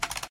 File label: click; Electronica Digitales; logic gates